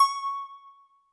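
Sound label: Jazz Harp C#4; Kawaii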